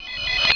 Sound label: teleport